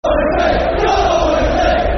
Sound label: Come On Wednesday, Football Chants; Sheffield Wednesday Swfc